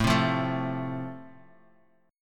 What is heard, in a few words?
A Minor Sharp 5th, Guitar Standard; 5,3,3,2,x,x; strummed; JGuitar